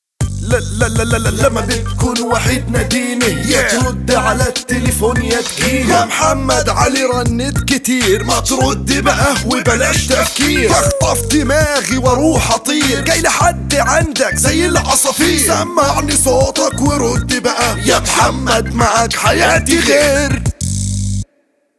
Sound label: Rap